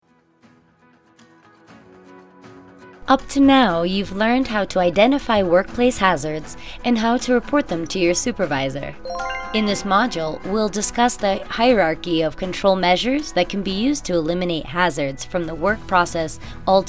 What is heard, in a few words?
E learning